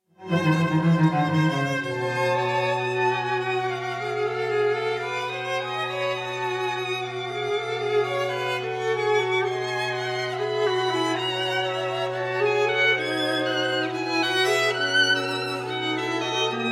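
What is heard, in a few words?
string quartet in d minor, 4th movement; op 12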